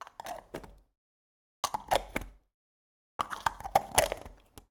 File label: Plastic Box Tiny Close Multiple, Closets Small Doors; SoundFxwizard